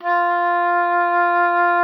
F4, OBOE; Xsample VOL-6

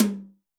tom, Zoom MRT-3B

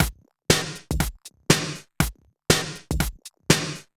Minimal, 120 BPM, Percussion; Sonic Foundry (Sony Creative Software); Underground UK House & Electro

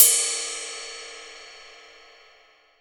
Ride Cymbal, 22INCH ISTAN RIDE

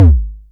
kick, Korg Electribe ER-1; Kit 22

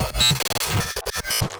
fill, fills

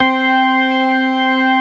STOPS, ORGAN; InVision Interactive; Keith Emerson; ORGAN SPLIT

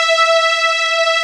Textural Strings; E-MU Producer Series Vol. 2 – More Studio Essentials; Composer

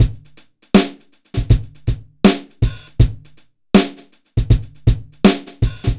BPM 80 AMB

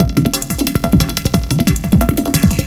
HYPER PULSE; FRENETIC FRACTAL GROOVES

